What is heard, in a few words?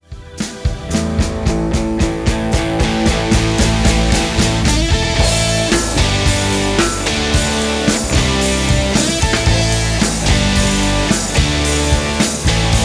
Rock